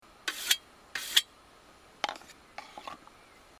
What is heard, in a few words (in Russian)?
tochilo